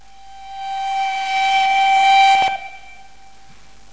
klaxon, Train; Re460 + EC 7x

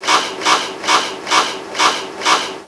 Draw Fwd, Train; OpenBVE

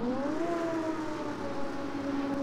Traction blower restart, Train; OpenBVE; Cl313 Silverlink

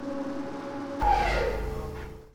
Traction blower stop, Train; OpenBVE; Cl313 Silverlink

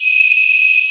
Klaxon; OpenBVE

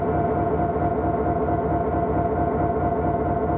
loop, train, class 66; OpenBVE

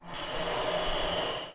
Air, Train; OpenBVE

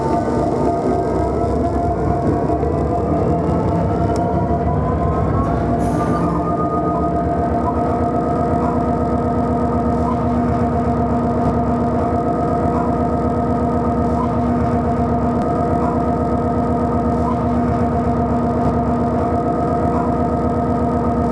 Rev up, class 66 train; OpenBVE

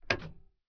Rev, train; Z8100; OpenBVE

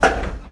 thwok, Train; OpenBVE; Cl313 Silverlink

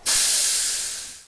Air, Train

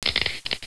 sparks, Train; Z8100; OpenBVE

